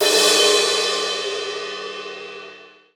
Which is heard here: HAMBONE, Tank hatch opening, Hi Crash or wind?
Hi Crash